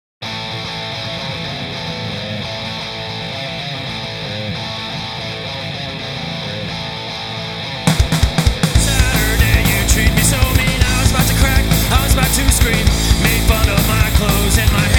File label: Electronic Aggression